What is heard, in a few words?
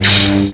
lt saber hit; MOTS